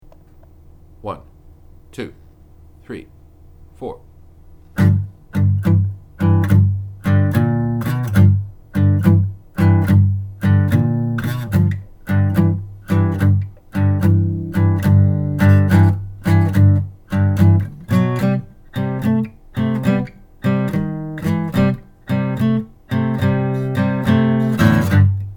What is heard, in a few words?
Slow blues G Funky